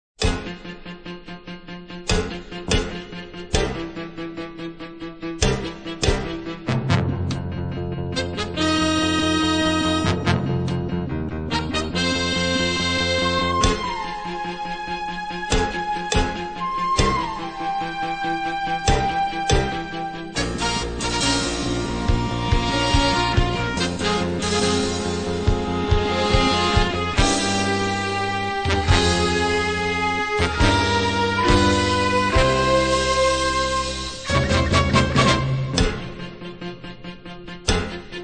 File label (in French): Musique combat